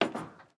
Ladder Step; MiSide

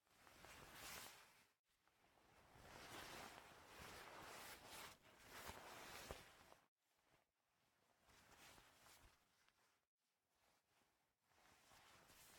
Wakeup; game audio; Mita; MiSide

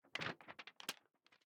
Paper Ready Take; Game rip; MiSide

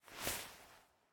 Take Bat; MiSide

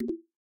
Error Click; MiSide

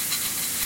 Valve Sink; Game rip; MiSide

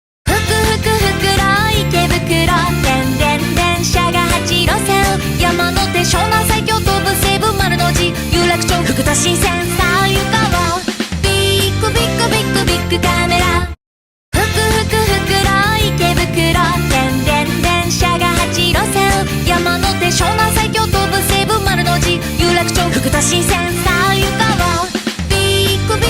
Theme Song; Bic Camera

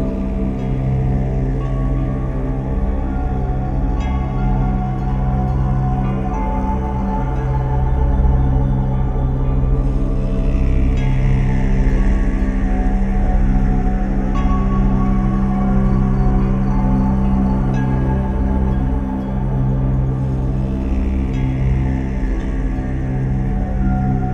Ambient Bag; Game rip; MiSide

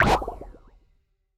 Plod; game audio; Mita; MiSide

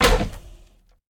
Chair Impact; Game rip; MiSide

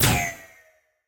Ship Shot; MiSide

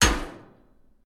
Cage Closed; Game rip; MiSide

